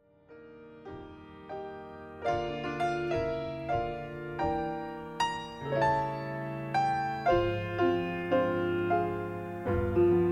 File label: piano solo